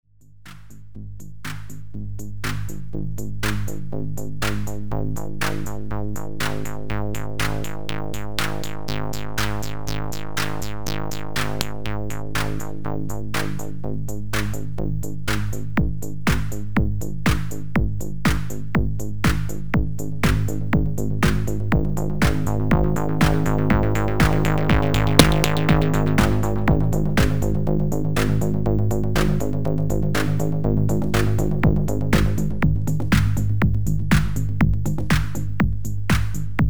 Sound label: drum